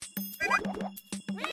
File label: Dodge